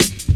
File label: Fat Stepz Cut, Drum Loops 167.5; Zero-G Jungle Warfare 1, 2 & 3